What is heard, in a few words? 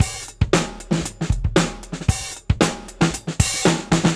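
115 bpm, beat loops; Zero-G; Monster Beats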